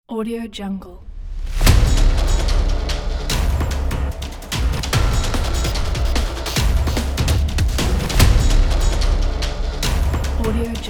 industrial percussive action drum

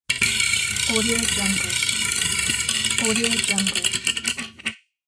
lucky wheel

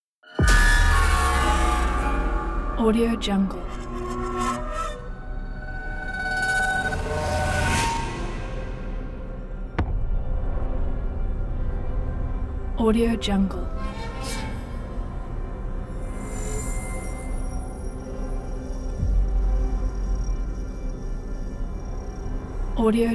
scary; musicservice

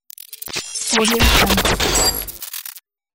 glitch

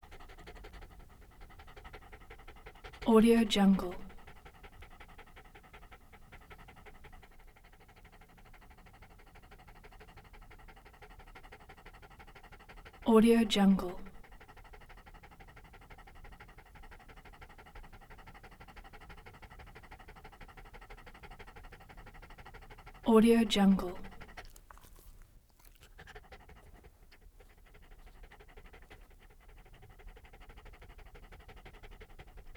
terrier small dog panting fast

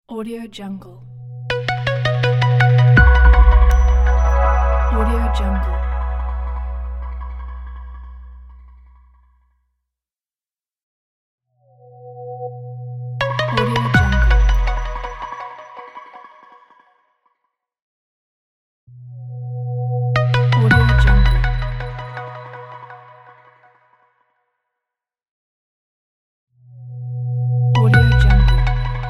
branding logo

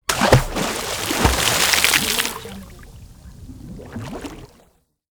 water splash

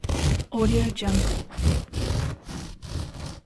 cutting slice of bread

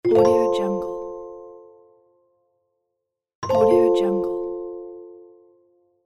right choice

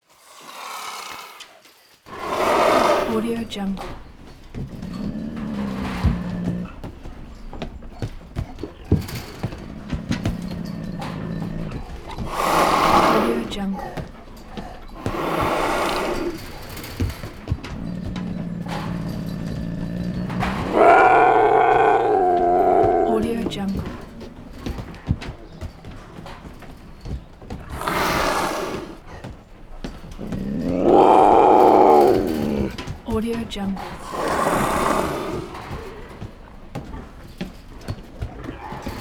puma constant growls sharp airy hissing; prosoundfx

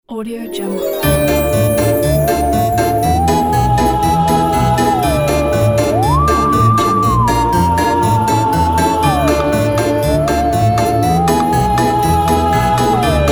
halloween intro ident

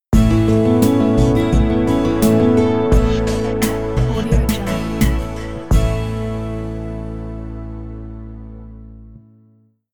colorful happy logo opener

